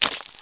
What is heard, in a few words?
flesh drop; heretic ii demo